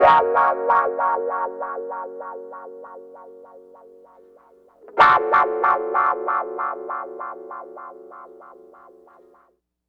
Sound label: Clavinet, 70s Analog Funk